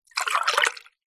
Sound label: drink water pour into tumbler drinking glass short fast; food